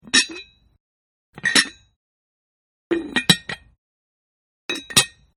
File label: Tea pot, Tea Pot Lid Open And Closing; Tea Pot Lid Open And Closing-2 Takes Each; Kitchen; Household